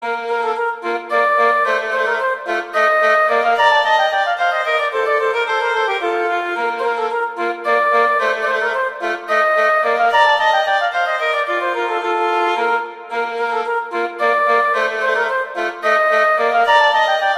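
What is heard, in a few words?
Duet, horn; Mozart; Duets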